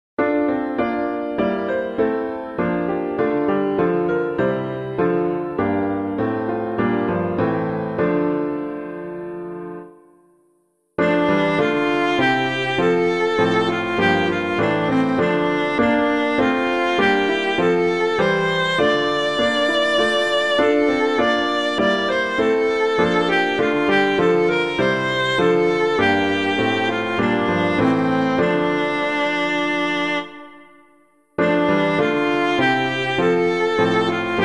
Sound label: Deliver Us O Lord of Truth, piano; Stuempfle Jr.; DETROIT